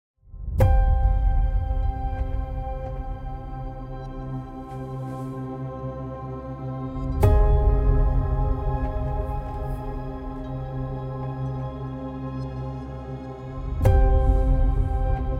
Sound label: Epic Orchestral Music; Space Engineers